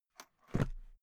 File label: briefcase plastic close metallic rattle; industrie wirtschaft; bueroarbeit